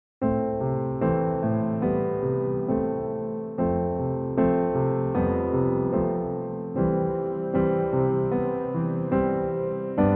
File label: Emotional, 75bpm; Purple Planet Music